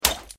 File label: tile short, Stone